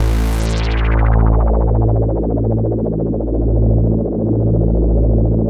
JELLO A, KORG DW8000, Vintage Keyboards; Big Fish Audio - First Call Vintage Keyboards

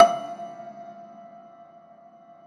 Rubbery Piano, prepared piano; Total Piano

